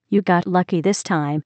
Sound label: death; freak fortress 2; mamabot